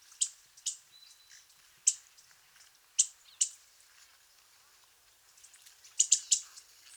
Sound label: call Black and white Warbler Mniotilta varia